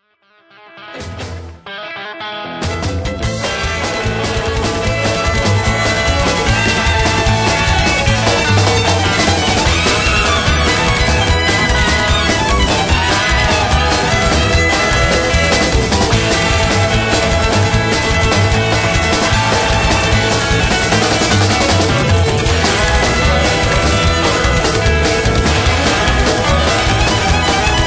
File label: Fiddling And Picking